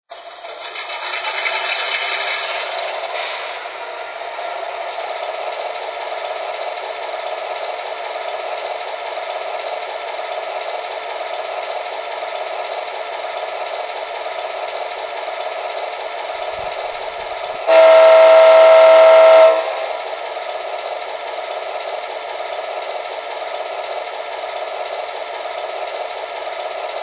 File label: sw1500, trains